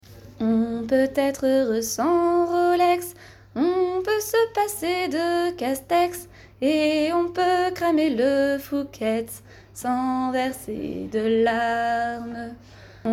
On peut etre heureux sans rolex

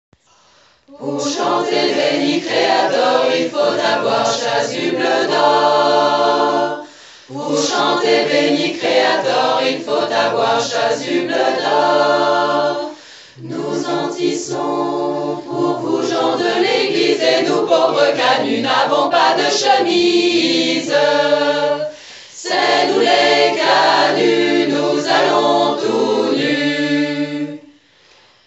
3 pupitres

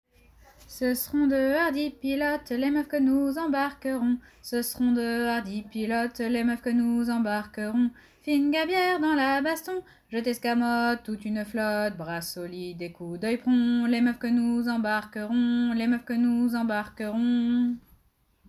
Voix basse

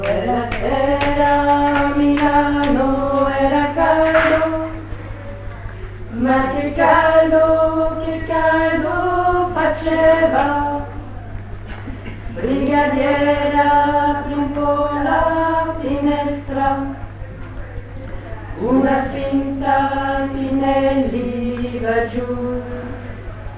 voix medium

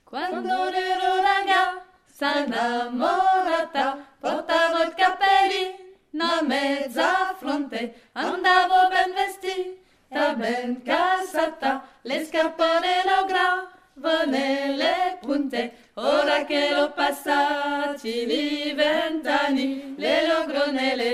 voix basse

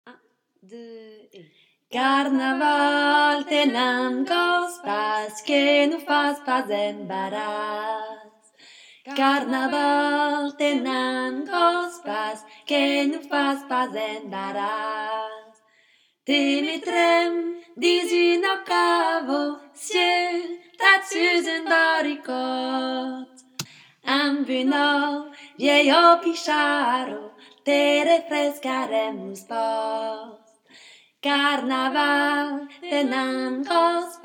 Voix

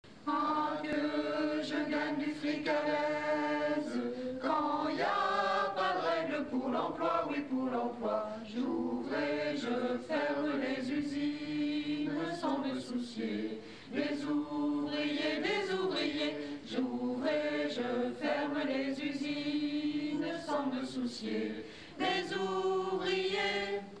medium

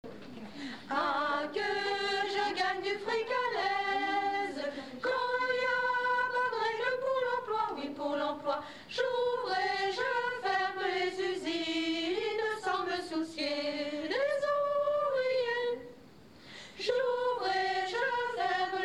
aigu

